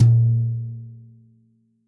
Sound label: FLOORTOM JAZZ brush center